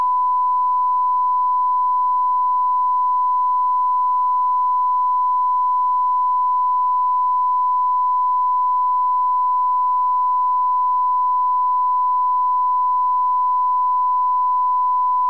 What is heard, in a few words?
tv 1kHz